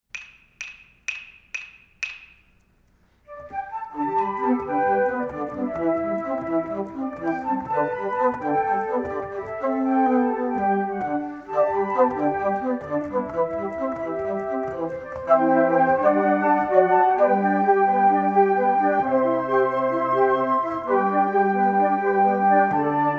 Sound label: Flutes For Sail